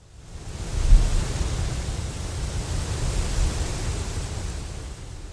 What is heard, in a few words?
wind tree; EnvSound